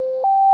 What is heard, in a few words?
key up